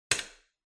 Light Switch Wide Panel, Hall Hard

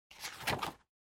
Calendar Flip

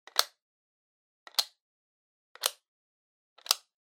Outlet Timer Pin Push; コンセントタイマー02-08(ピン 押す); タイマー機器

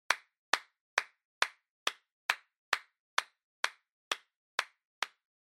Applause Stable Slow

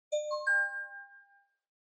News Alert Far

